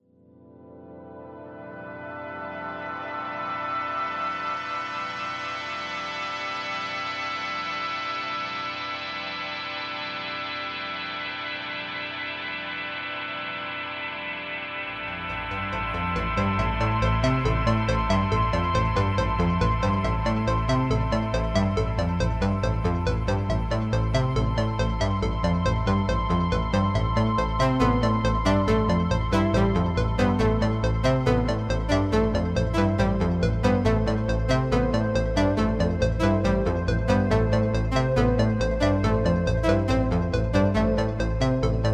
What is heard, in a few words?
Oberheim